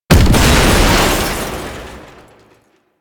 Shield Lancer Weakpoint Destroyed; Warframe; Lasrian; Lasrian Soldiers; Weapons

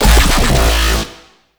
Infested Shotgun Growl; Weapons

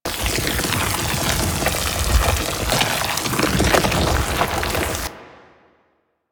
Chain Reaction Beam End Loop; Warframe; Warframes; Qorvex

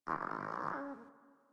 Catbrow Kitten Snore; Warframe

